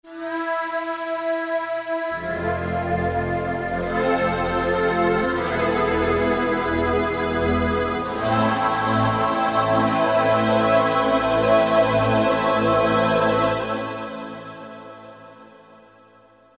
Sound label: Husky Voices, Roland; Roland PN-D50-03